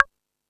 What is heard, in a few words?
Rap Session, E-MU Pro-Cussion